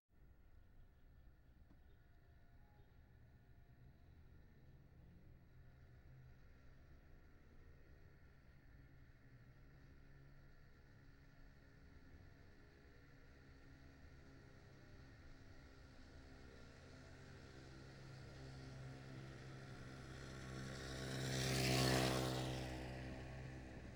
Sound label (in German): mofa faehrt vorbei; geraeusche digital